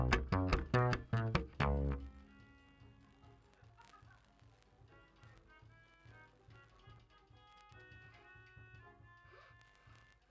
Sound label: Bass DI